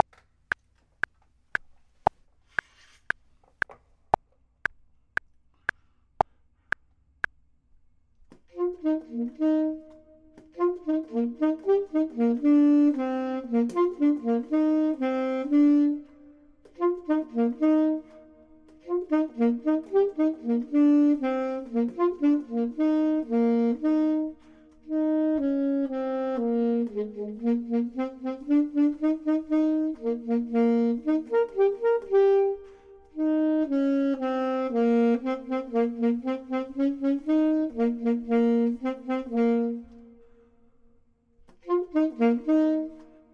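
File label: bottom, Saxophone and Clarinet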